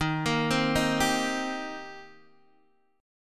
D sharp 6th, D sharp, down, Guitar, DADGAD

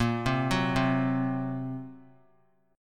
Bb Suspended 2nd Sharp 5th; Bbsus2#5; arpeggiated; 22,19,21,22; JGuitar

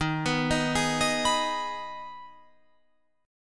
Eb Suspended 4th Sharp 5th Eb Guitar Open F; Ebsus4#5; arpeggiated

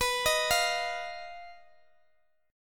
B Major Flat 5th, Guitar, Open D

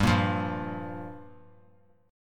G Minor 7th; Gm7 strummed; JGuitar

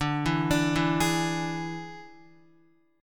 D Suspended 2nd Flat 5th D, Guitar; x,5,4,7,4,4; arpeggiated

